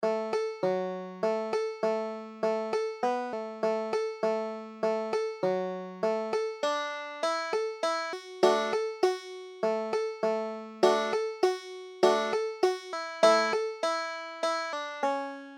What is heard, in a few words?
banjo